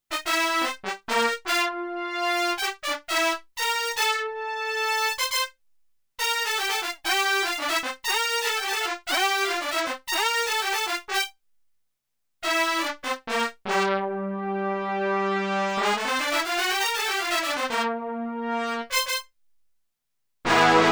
Pop horn

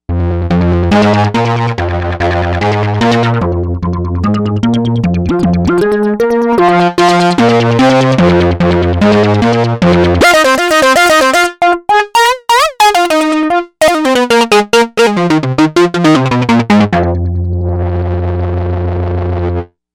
Filt Repeat, Novation UltraNova; Factory Patches

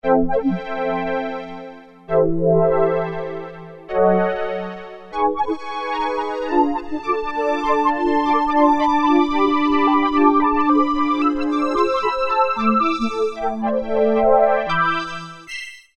Syn Echo, Roland MKS-50; Factory examples

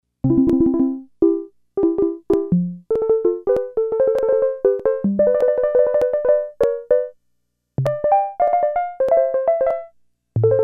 Marimba

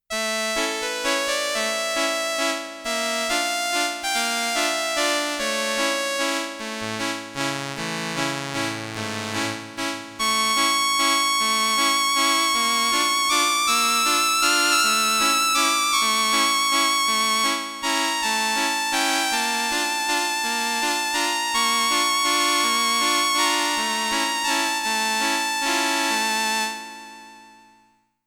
STRINGS II with vibrato & sustain, Roland RS-202

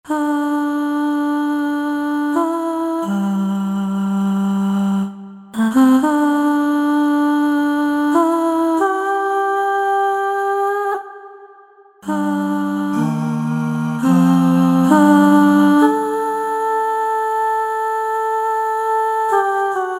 Female Vox; synthesizer; Guess the synthesizer game

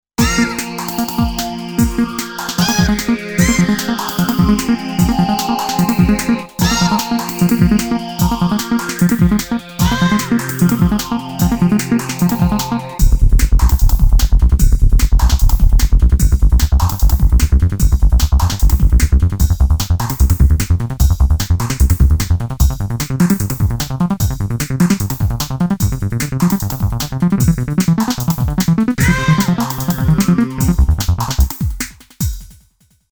Speed Racing

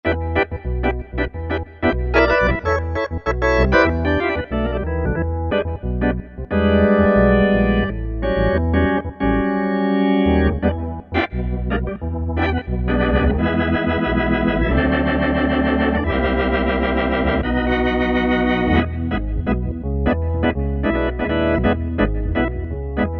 Tone Whl Organ, Kurzweil K2000; KEYBOARD; Kurzweil K2000 Contemporary ROM; Contemporary ROM Programs and Demos diskette

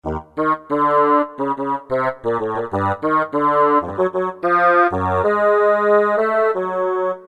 BASSOON; Roland SN-U110-06

